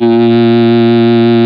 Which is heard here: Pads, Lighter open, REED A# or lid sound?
REED A#